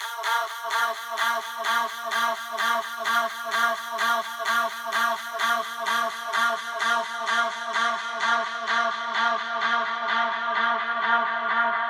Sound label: 128BPM Ou Ou Ou A#, Melody Fills; 8Bars; Vengeance Samples